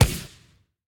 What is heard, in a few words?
win Symbol Flash Add On; fairyhansel